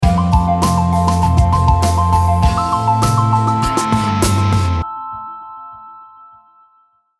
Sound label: run sting funny summer happy travel sports vlog music